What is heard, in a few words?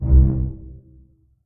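string pizzicato C1 pianissimo left; Sound On Sound - Peter Siedleczek's Orchestra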